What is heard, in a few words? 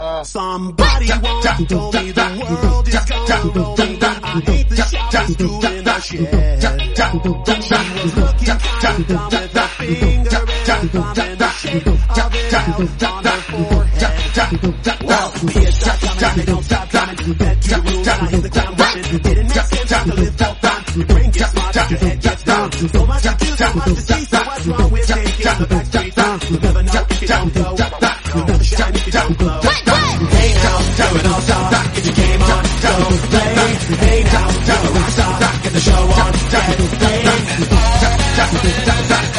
funk remix